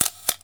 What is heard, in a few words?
Camera